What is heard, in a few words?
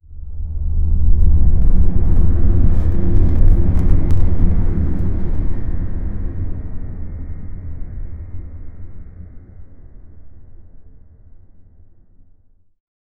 a deep continuous air rus, air rush